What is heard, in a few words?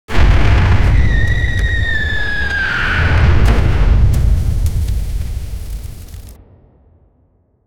a furious phoenix bird; mythological